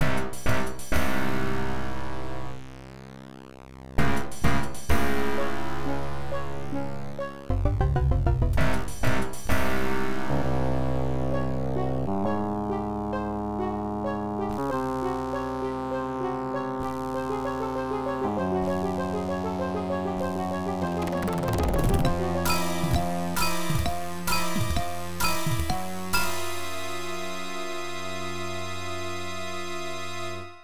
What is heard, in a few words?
TERROR; Spider CM - FM